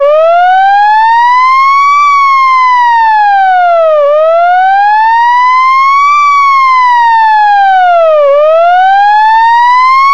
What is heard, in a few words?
police wail siren